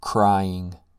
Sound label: crying